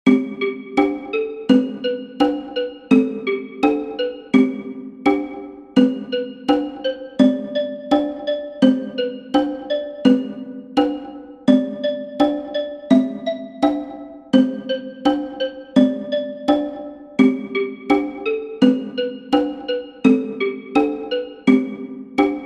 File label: Cajon; Auf der Mauer